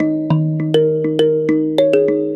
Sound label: Mbira; Melodic; Ambient-Melodics-II